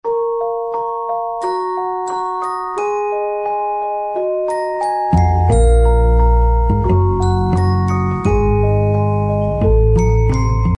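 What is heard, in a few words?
kolybel ka sms melodia, lullaby sms melody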